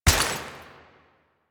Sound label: Step Water, Footsteps